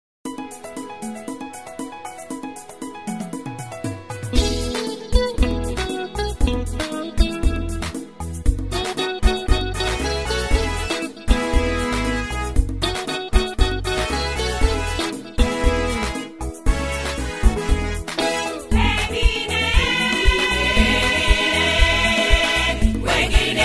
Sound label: Choir